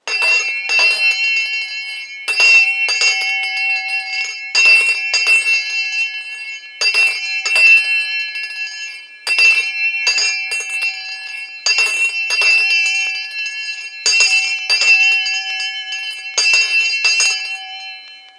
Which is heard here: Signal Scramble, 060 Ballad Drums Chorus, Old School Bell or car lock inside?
Old School Bell